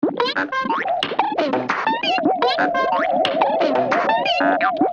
Funny machine, Fanfare; 1997 MMX LAN PACK (win 95); Worms Armageddon

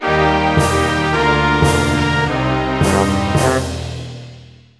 Canada, Fanfare; 1997 MMX LAN PACK (win 95); Worms Armageddon

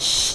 ooff; Team17 Test

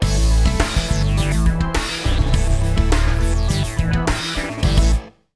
Buzz rock, Fanfare; 1997 MMX LAN PACK (win 95); Worms Armageddon

